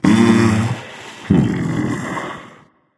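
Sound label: nonverbal agree; smite; voicepack; merlin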